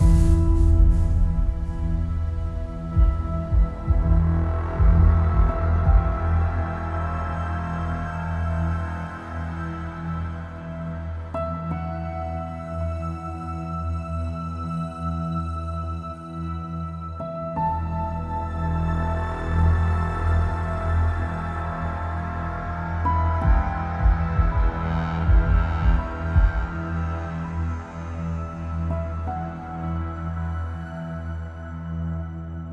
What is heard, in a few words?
BGM Battle